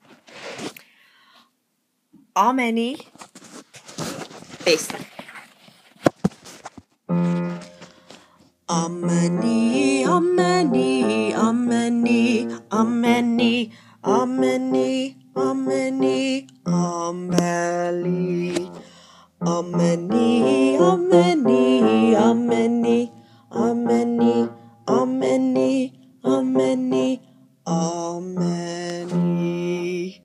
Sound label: Bass